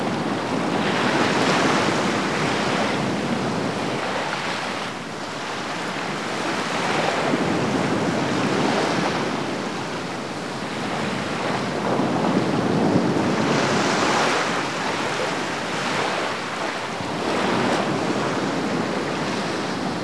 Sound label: ocean